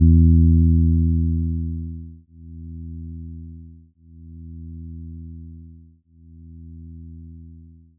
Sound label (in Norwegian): syntetisk gitar E